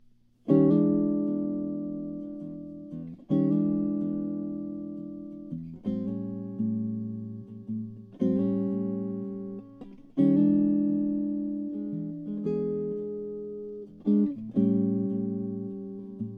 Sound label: tom bills natura big leaf maple